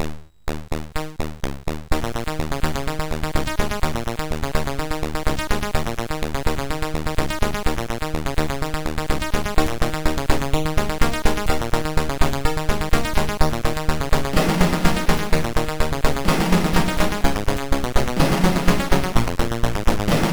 Intromusic, sid

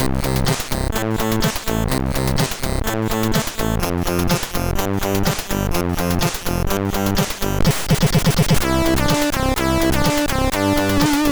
sid MOS6581R2

